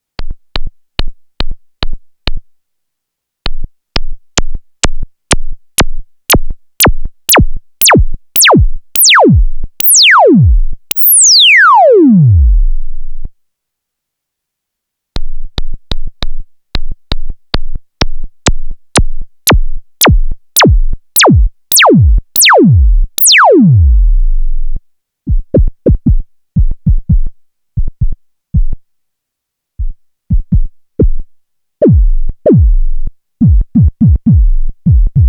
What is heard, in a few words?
envelopes reso zaps, p600